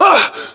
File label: pain, english